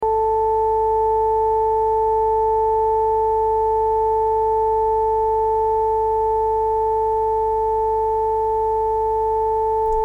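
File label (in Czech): tón 440 a 880 Hz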